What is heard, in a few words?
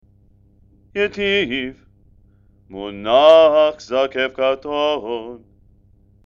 haftarah, trope